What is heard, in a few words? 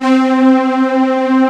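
M12 strings C3; VINT.KEYS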